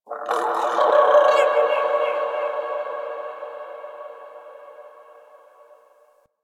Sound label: Venus Landscape Distant Creatures Zone Two; Ambience; Warframe; Venus Open World